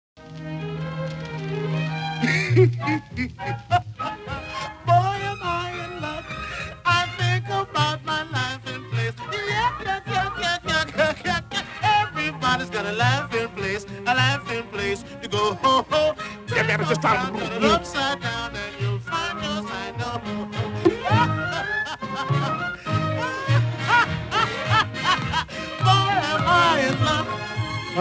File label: laughing place